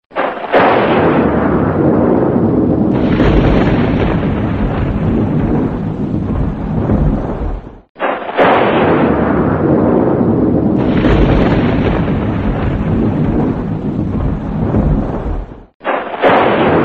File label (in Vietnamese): Tieng Sam Set